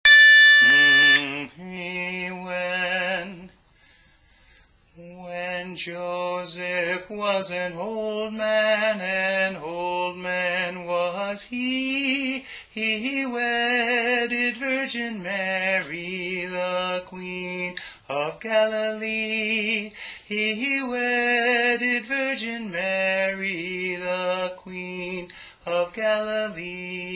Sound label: tenor; Cherry Tree Carol